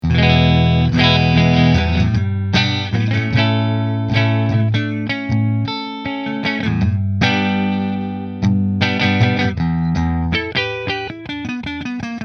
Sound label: Nola JB14 Flame Middle Split Fender